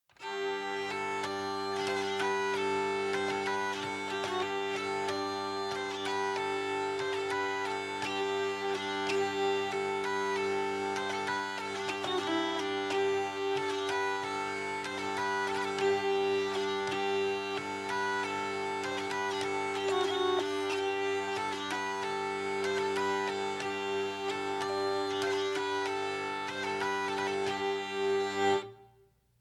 Tavern; assassin s creed unity gamerip 2014

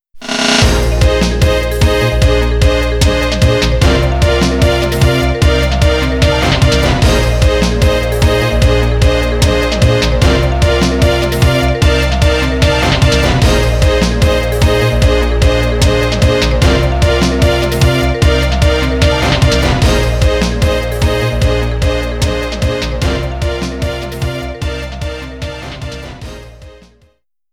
Unused Clear; ΔZEAL; Shooting Love. 10th Anniversary XIIZEAL ΔZEAL Original Soundtrack

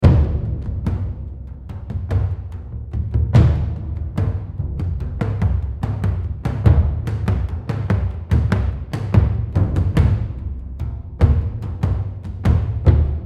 Perc Build

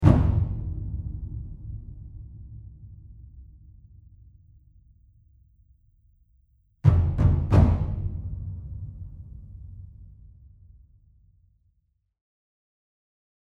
Huge Drum Slams Loop; The Last of Us

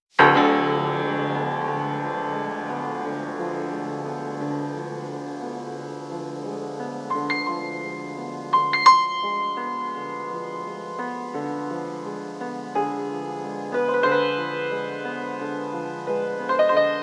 Clean Silent Eerie House; the movies 2005

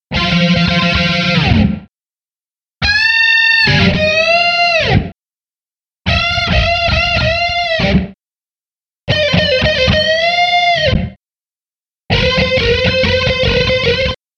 Riffs; Lego Island Xtreme Stunts; Ripcord Rescue Riffs

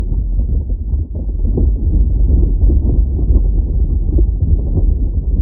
rocks rumble sweetner; Scrat

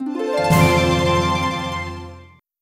Item Creation

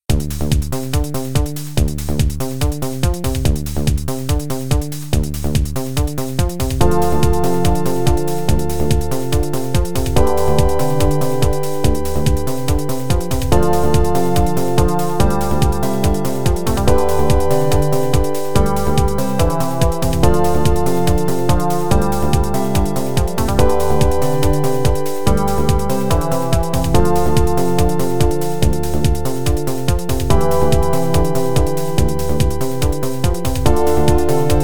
War Plains (Sound Blaster Version); zone 66

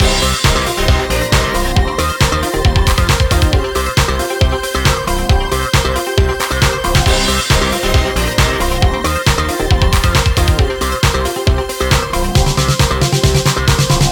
BGM ramen

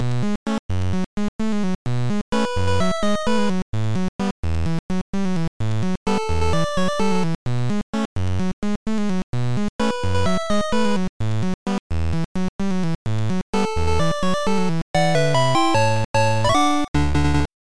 Power Up~Clear; Bomberman Mode; bomberman the music

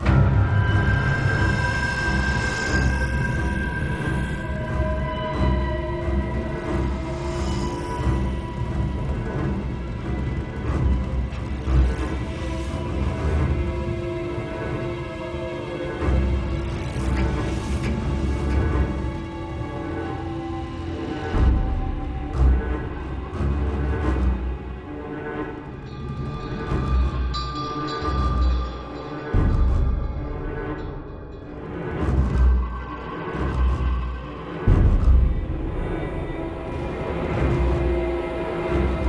Music Tension; Hunted: The Demon's Forge; x360

